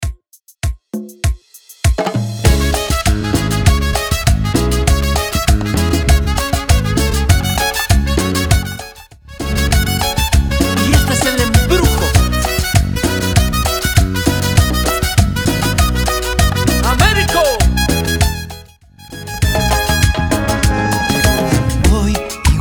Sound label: 99 BPM Intro Outro